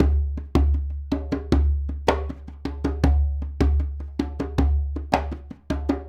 Djembe

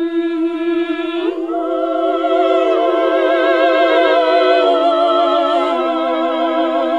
SING, CLASSIC VOX; Best Service; Hallelujah

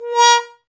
BRS BONEWA, Solo T.Bone; Roland L-CD702

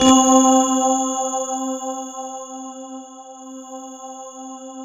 C3, Synth; NiceBeats

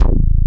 low end bass; Dance; BASS SOUNDS; Masterbits; Dance Trax

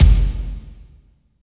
KICK, Tekno Industrial; East West

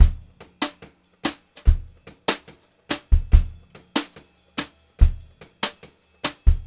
144 vari, Brushed Drum Loops; USB Soundscan vol.39; AKAI

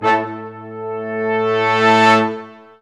BRS, Lrg Ensemble; Roland L-CD702

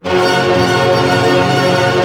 ARP C MAJ FF, STR ARP; Sound On Sound; Peter Siedleczek's Orchestra

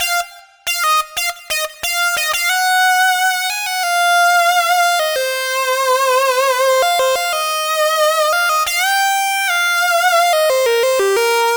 Lead Synth Lick; Dr. Fink's Funk Factory

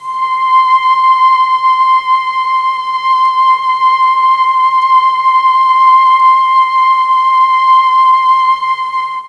C6 300 VIOLA, Mellotron, STRINGS; Mike Pinder - Mellotron Archive CD

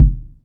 Kick, Roland MV8800; PLUTO KIT

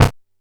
C2, Bass; E-MU Producer Series Vol. 6 – Analogia Project; Boom da Bass

